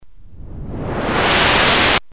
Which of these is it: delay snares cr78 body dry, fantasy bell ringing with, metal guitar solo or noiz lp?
noiz lp